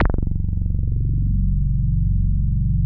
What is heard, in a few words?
303 F#2; Masterbits; Tekkno Therapy